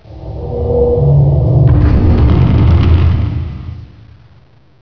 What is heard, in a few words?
big metal rumble; valve; coil